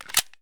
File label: kedr mag in; Escape from Tarkov